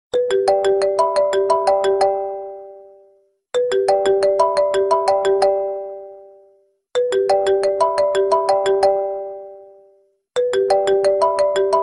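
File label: samsung, ringtone; zange samsung makhsose hamye goshihaye samsung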